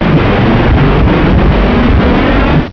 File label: ambient roof top war; hl2